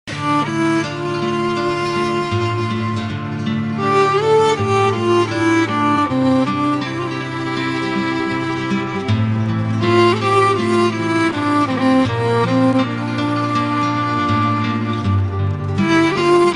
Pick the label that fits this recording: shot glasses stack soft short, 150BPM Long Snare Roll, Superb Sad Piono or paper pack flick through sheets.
Superb Sad Piono